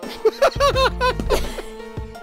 laugh

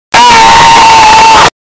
death sound; lego yoda

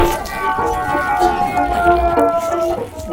man scream; How To Survive Falling Into A Volcano; zack d films

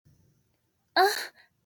moan

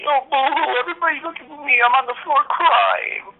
boo hoo

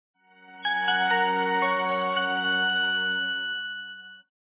nokia startup

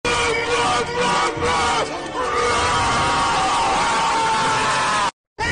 cow noise

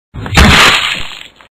Pumpkin Smash

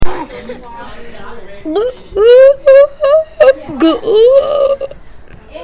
screaming guh

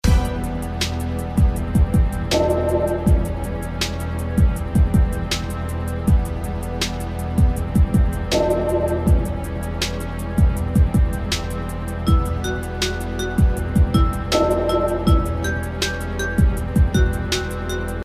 pause music; golden eye 007

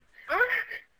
short moan

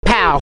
pow

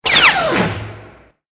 crash lightsaber; lightsaber noise

